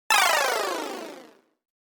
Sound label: game over; ping-pong